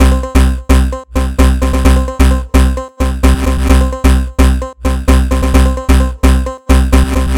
Beat Loop; DF KIT

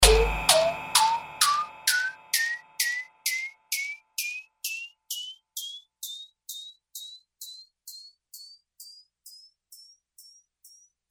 CAIXA

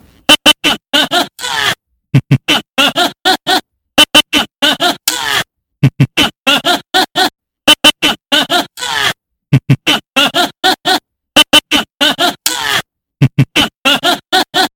Vox Loop Arcade Beat

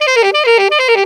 IN THE GARDEN

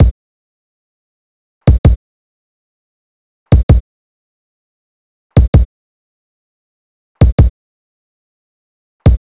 BEAT PAUSA

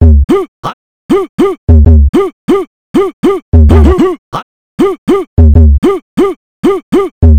ARCADE BEAT

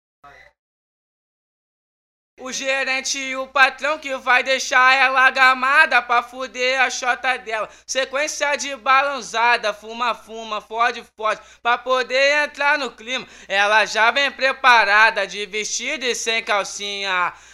Acapella

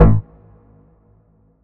Metal Bass; DF KIT

